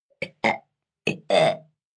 Burp Several, Burp, 1 child, several, comical, human voice, kid; Burping Sound Effects